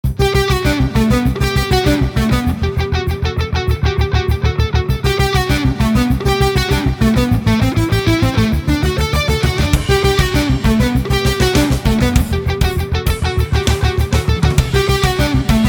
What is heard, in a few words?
Guitar Cover; BattleToads